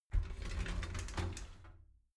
shower slide door inside open soft halfway

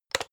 electric whisk turn on fast, kitchen; haus-garten; kueche

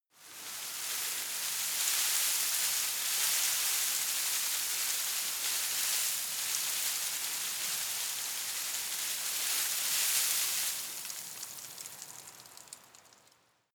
shrub tiny leaves rustle medium; haus-garten

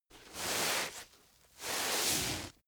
door mat wipe shoes; haus garten; wohnen

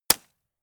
gun shot silencer close; schusswaffen

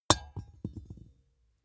small metal drop clang hard; industrie wirtschaft; metall

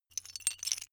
metal pieces clatter short

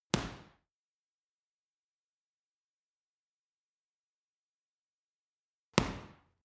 boxing punching bag side hook hard; sport freizeit; boxen

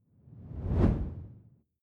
whoosh simple low; swooshes-swishes

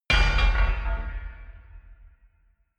metal horror clang, metall; industrie wirtschaft

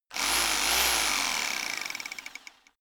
rock drill chisel burst rev up; schrauben drehen hydraulik

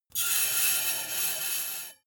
metal screech phaser steady short

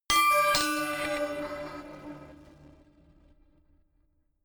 notification glockenspiel crazy third up; instrumente

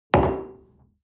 coffee place table hard, restaurant cafe; sport freizeit

